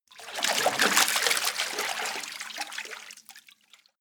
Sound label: water strong